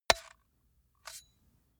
metal pole

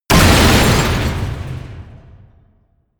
smash, baugewerbe

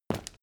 step land soft dry; schritte

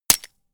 gun shot silencer attached; schusswaffen

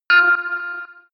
bing delay high; kommunikation; signale

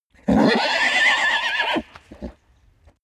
horse neigh loud; natur-tiere; pferde

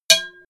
hit pot midsize, percussion

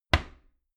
door wood close heavy

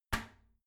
toilet seat lid close soft; haus-garten

